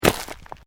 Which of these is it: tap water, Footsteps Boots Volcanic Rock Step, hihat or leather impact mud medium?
Footsteps Boots Volcanic Rock Step